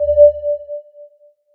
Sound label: Sonar; Evac